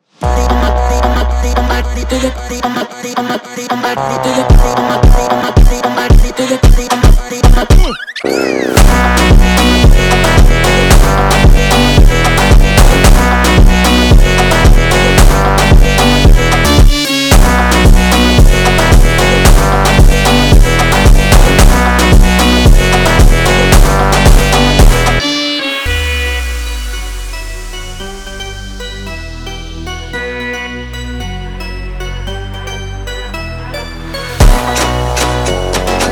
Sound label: Funk slowed